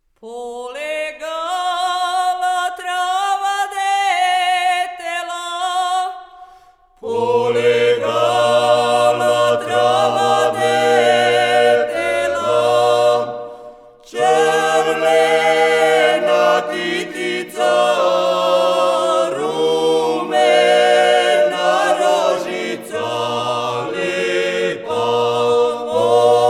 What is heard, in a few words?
acappella; Polegala